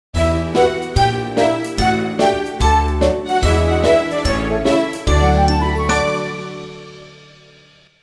Enfant histoire link

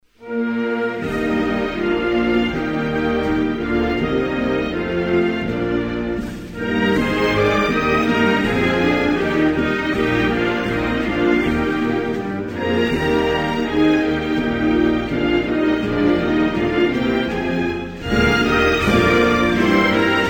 Islande, Hymnes nationaux

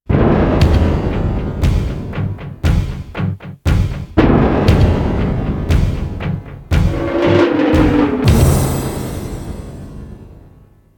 Etrange link, jingle; Jingles medley

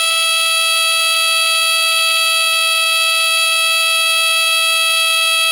Alarme de detresse

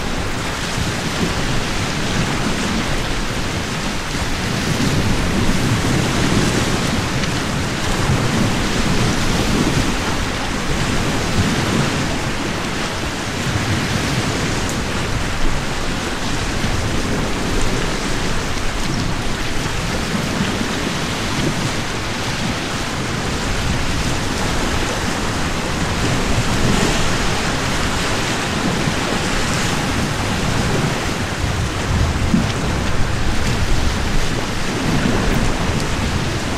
Mer tres calm ete brise

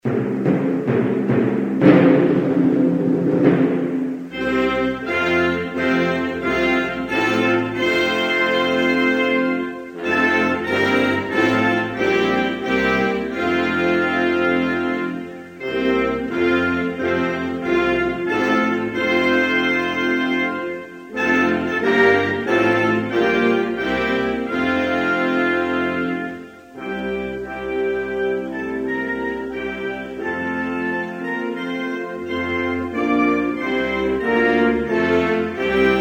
Nigeria, Hymnes nationaux

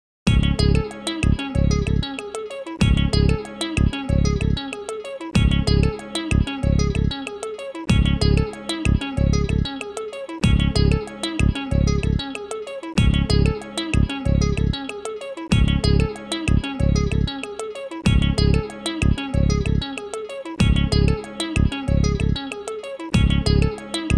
Reflexion Minimalist; Jingles medley

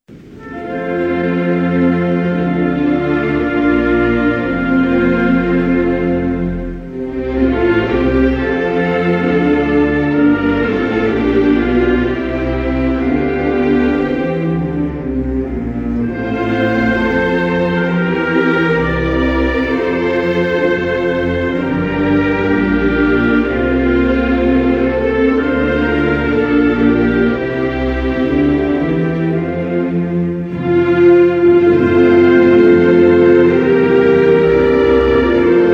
Malte, Hymnes nationaux

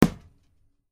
Coup de poing

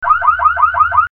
Alarme

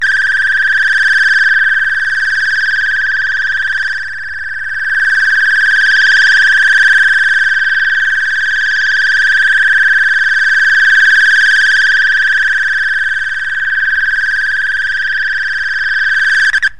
Alarm alerte

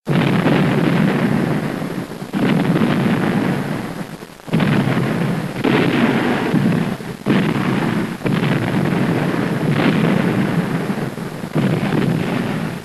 Bombardement, Explosions

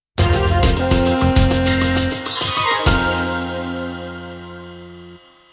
Emission link, jingle

